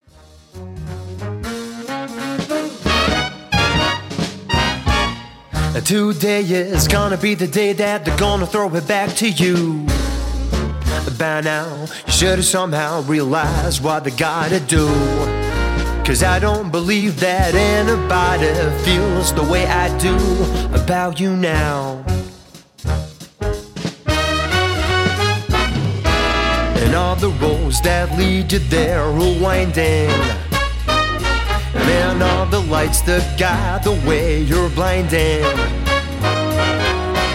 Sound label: Smooth Swing